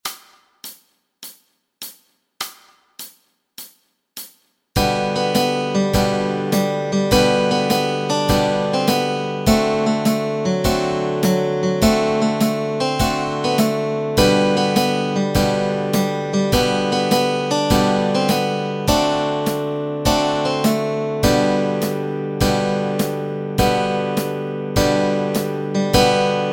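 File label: melody and chords, Easy Guitar and Ukulele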